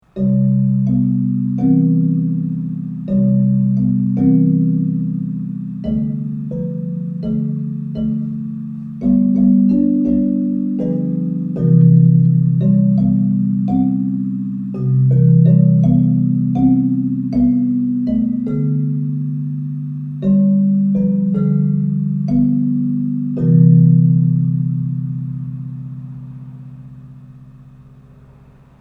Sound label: metallophone